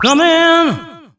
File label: COMIN VOX, Funky Vocals, SHOUTS; USB Soundscan vol.18